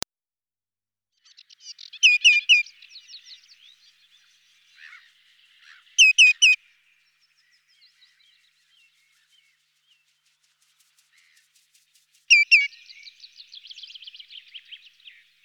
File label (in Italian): TOTANO; CANTI SAG NATURE 2024